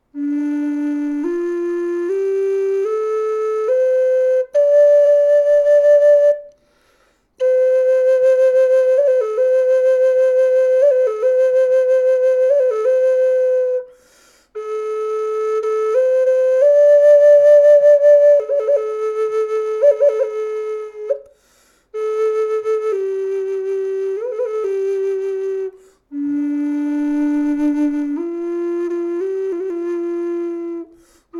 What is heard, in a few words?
flute